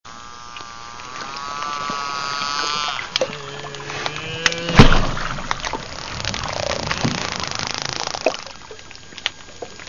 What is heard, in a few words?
kerplk